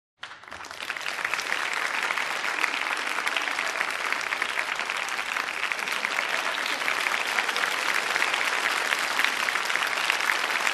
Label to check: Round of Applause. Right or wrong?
Right